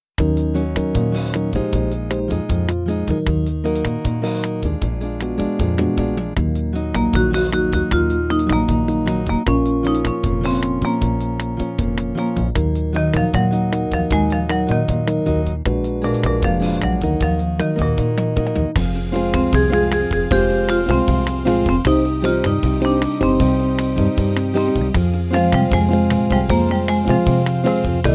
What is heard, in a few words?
Jazz